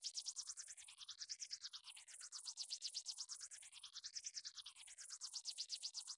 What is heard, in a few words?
spitter fly loop; Hollow Knight